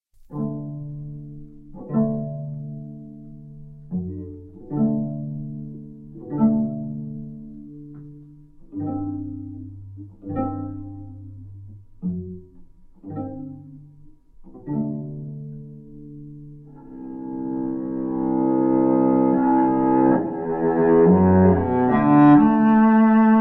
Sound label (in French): improvisation inspire par un chant juif allemand